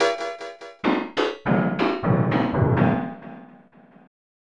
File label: Piano (Foot); Clock Tower The First Fear